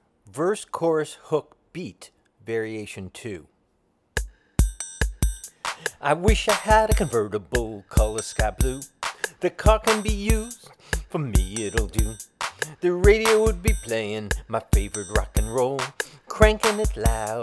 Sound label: Verse Chorus Hook Beat Variation